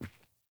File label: Grunt Boot Walk Default; Characters; Enemy; Melee Grunt; Footsteps; Boots; Sinecure